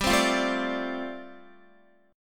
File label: G 7th Suspended 2nd Suspended 4th, Guitar; G7sus2sus4 strummed